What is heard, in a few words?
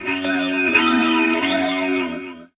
F, Guitars; Total Drum&Bass